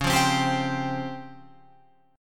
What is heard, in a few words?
C sharp Major Sharp 11th, Guitar Open F; C#M#11; strummed